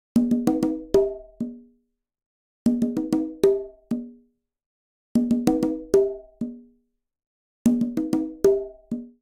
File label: Songo 2 Quinto Conga Tumba 96bpm, Latin Percussion; Ableton; Factory Packs